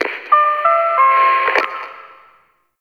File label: Chimes Out; Cinematics; Warframe; TheNewWar; Zariman